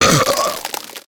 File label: eject; weapons; dota; pudge